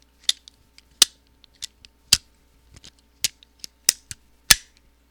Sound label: Sticks Breaking